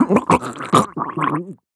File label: plunge; Modern Warfare